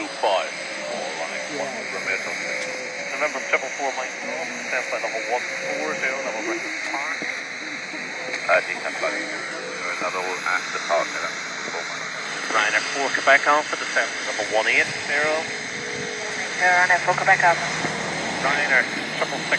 air traffic 30MHz; shooters hill

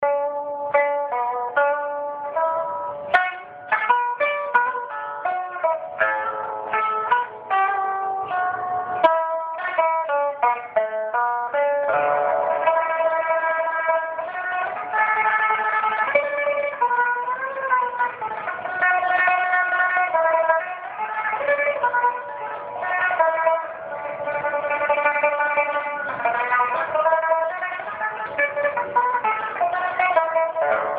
pipa practice

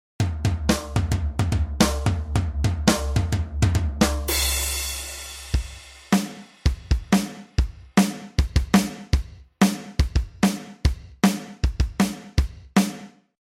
drum groove

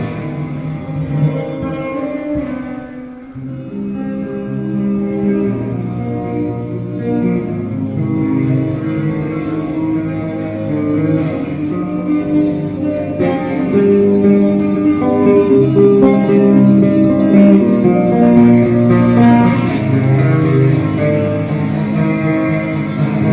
tunnel flamenco

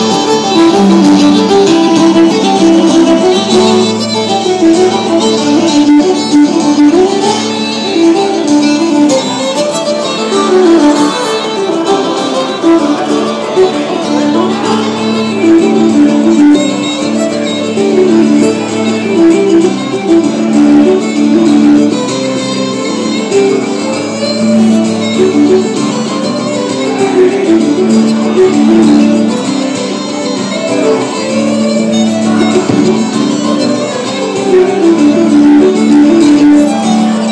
Irish music beer festival; themet

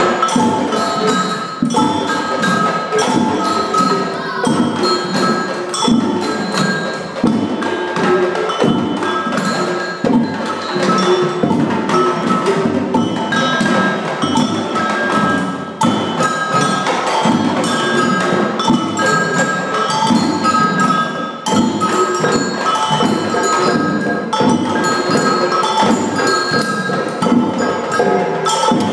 Samba

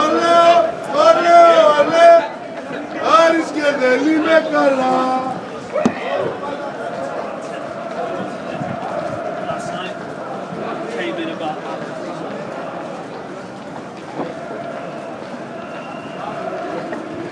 chanting Manchester street

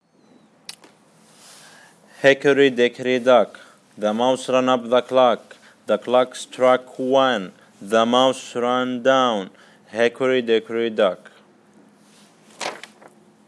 rhythm practice

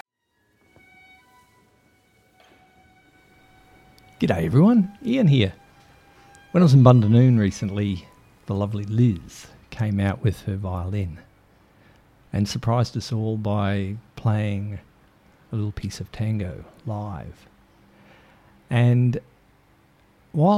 live piece of tango on the violin

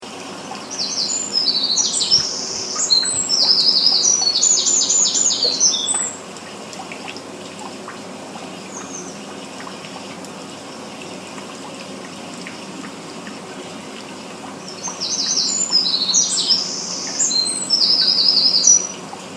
wren singing early morning

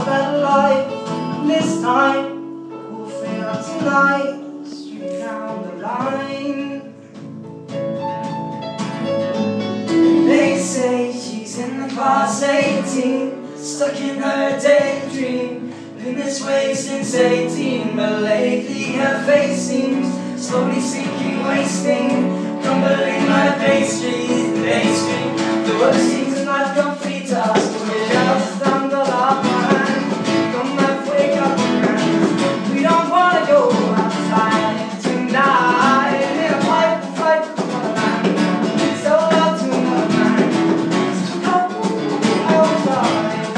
morning rush busking audition

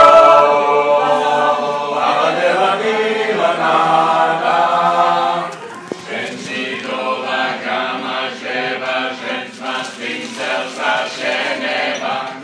random choir

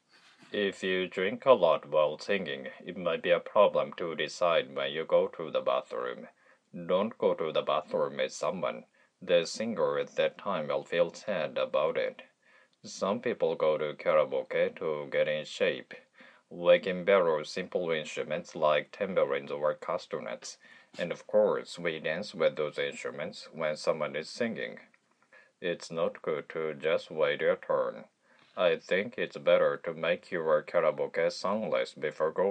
karaoke

